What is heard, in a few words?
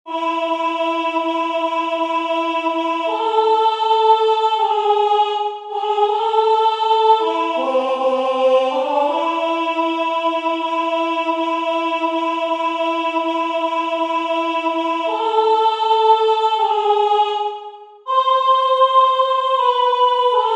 DONA LA PACE Soprano; TAIZE